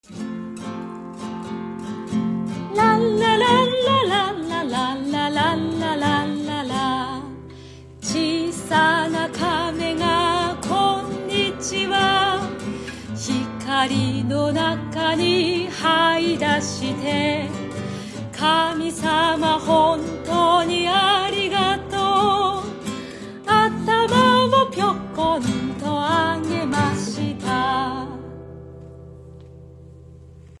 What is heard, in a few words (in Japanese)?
ちいさなかめがギター